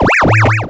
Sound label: SHORT, SHORTNOIS